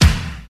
steel snare drum sound D key, steel snare drum tuned to the D note